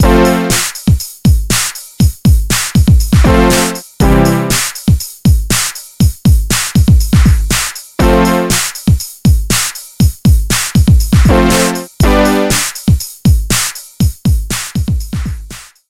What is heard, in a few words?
synth brass